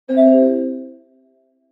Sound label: notifications